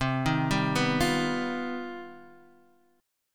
C Minor Major 11th C; CmM11 Chord {X 3 1 0 0 1} arpeggiated

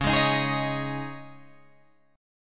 C sharp Suspended 2nd, Guitar, Open G; 11,13,13,13,14,13

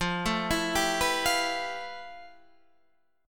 F Major Sharp 11th, Guitar, Drop D

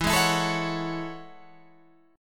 A Major 13th, guitar

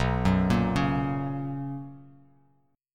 B Major 7th Suspended 2nd Bass Standard, chord; BM7sus2; arpeggiated; JGuitar

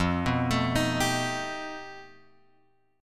F 9th Flat 5th F; F9b5 Chord {1 2 X 2 4 3} arpeggiated

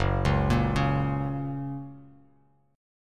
Gb Major, Bass Standard; Gb arpeggiated; JGuitar

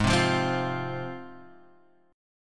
G sharp 6th G sharp, down; strummed; JGuitar

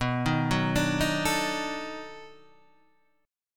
B 7th Sharp 9th, down, Guitar, Standard; B7#9 arpeggiated; JGuitar

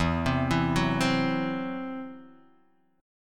E Suspended 2nd Sharp 5th, Guitar, Open D; 2,3,2,0,3,x

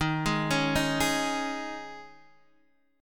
D sharp 7th Suspended 4th, Guitar, Open D

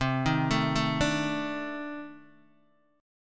C Minor 6th Add 9th C Guitar DADGAD

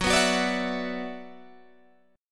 Gb 7th Suspended 4th Sharp 5th, Guitar Open G; chordshape; down

